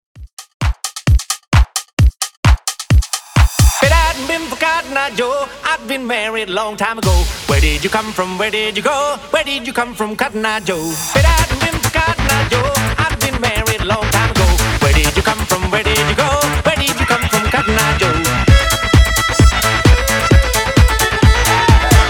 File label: 90s Redrum 131Bpm